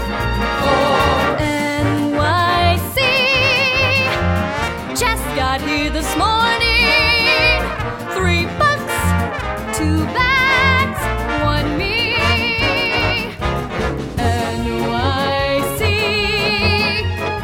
NYC Audition Cut Vocal

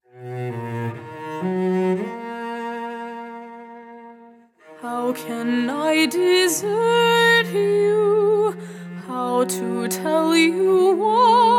Vocals

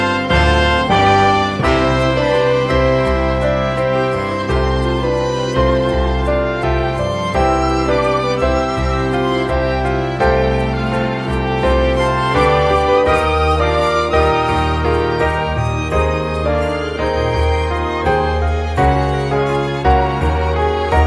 Instrumental

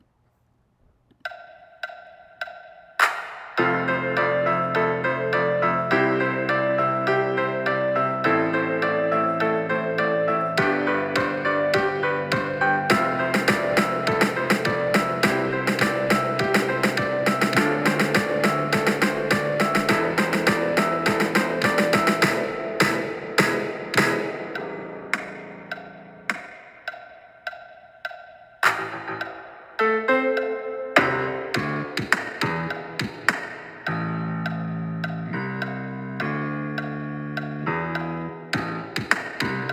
Instrumental

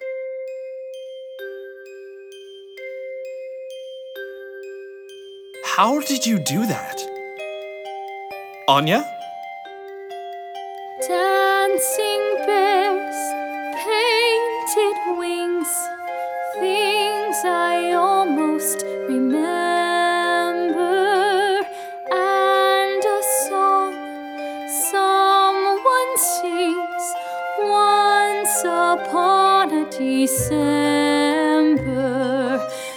Ensemble; anastasia the musical

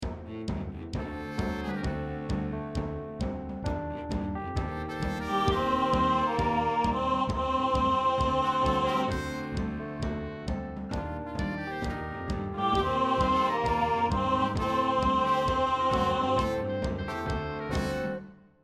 Baritone

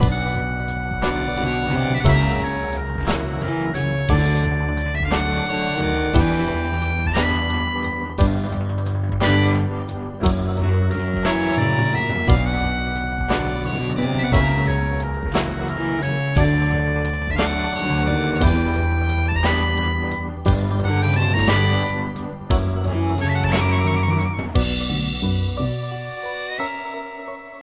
Audition Cut Instrumental

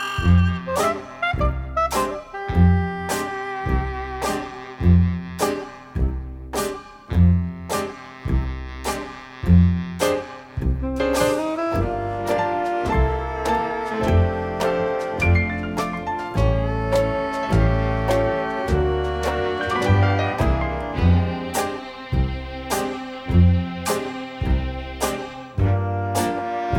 Audition Cut Instrumental

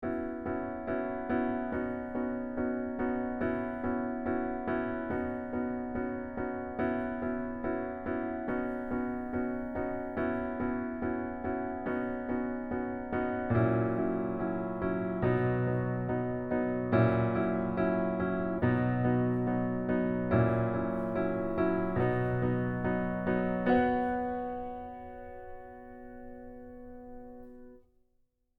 Orchestra

